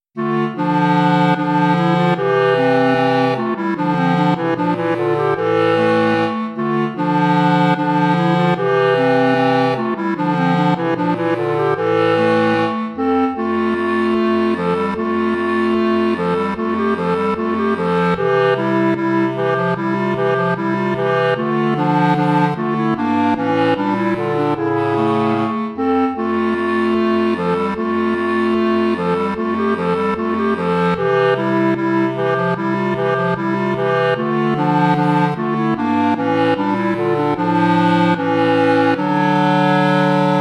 bourree; praetorius michael

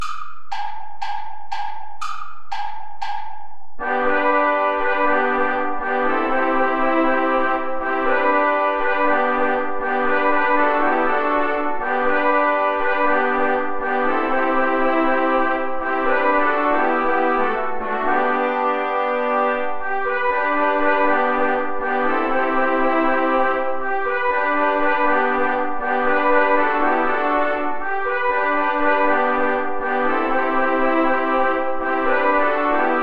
play along without trombone; traditional

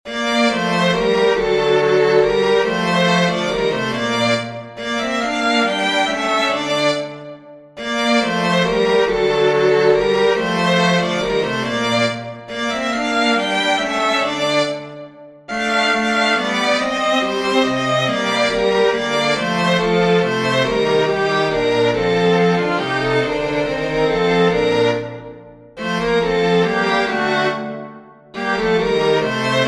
string trio; Risentito; Balletti cinque voci

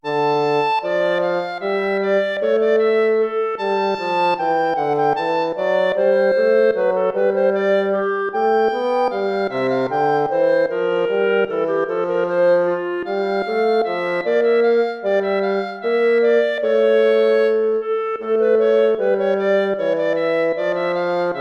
air, hornpipe

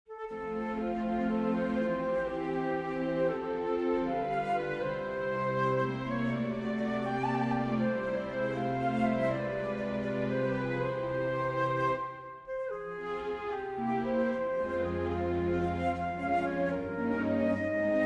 for flute strings; Schubert, Franz Peter

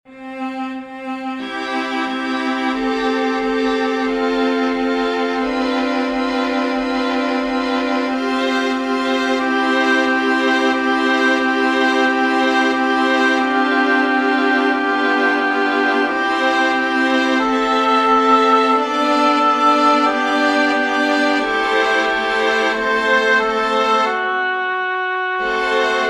aria for oboe strings; haendel georg friedrich; cum dederit from nisi dominus

